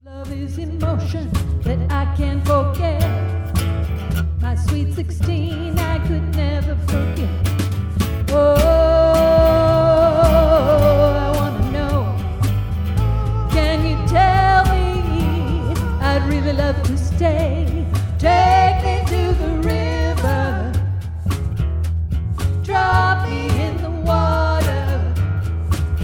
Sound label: acoustic snippet